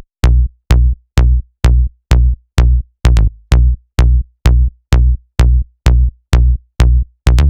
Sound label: 128 BPM Tonal Bass Loops B; Melbourne Bounce; ULTIMATE MELBOURNE BOUNCE VOL. 1